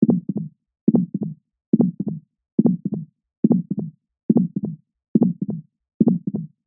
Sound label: human child heartbeat fast